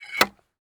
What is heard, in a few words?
insert, rocket; gmod; arccw uc commons attachments